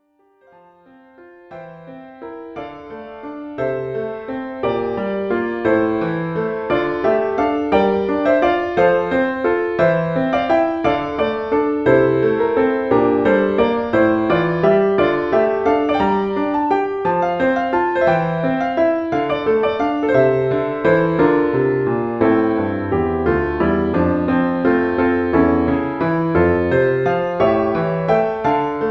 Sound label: advanced piano solo